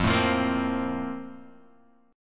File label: F Major Add 11th F; Fadd11 strummed; JGuitar